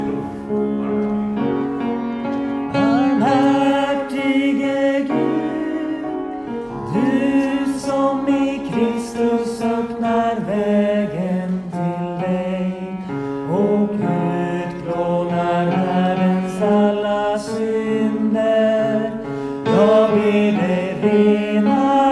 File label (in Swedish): Barmhärtige Gud Tenor